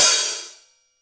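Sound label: HI-HAT CLOSED; Greytsounds; HI-HATS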